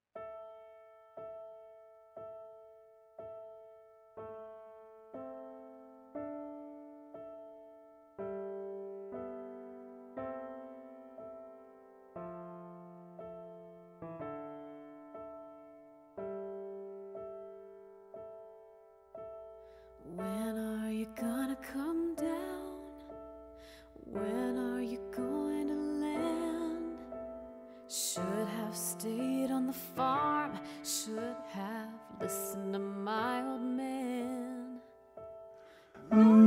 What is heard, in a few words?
Tenor; Practice Room